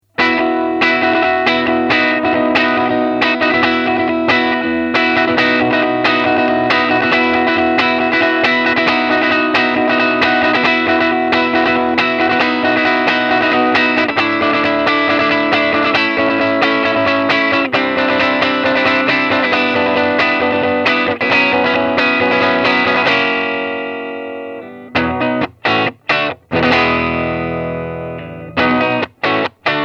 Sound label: Clean